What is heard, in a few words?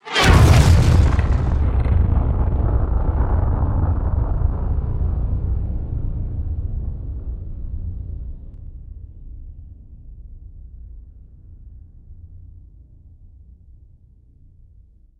Mega Explosion; Mace Griffin Bounty Hunter; ps2 windows xbox